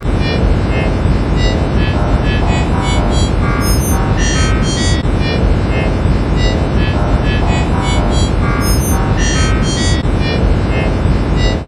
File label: industrial bleeps